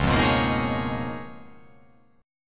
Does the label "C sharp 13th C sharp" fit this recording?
Yes